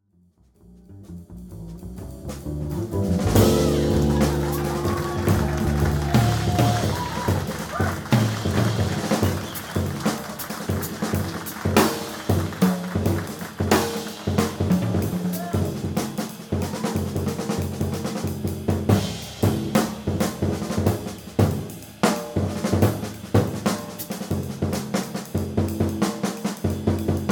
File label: Question and Answer Solo